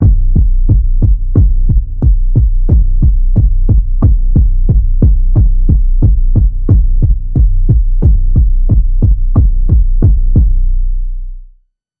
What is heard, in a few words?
Underwater Pulse Wave B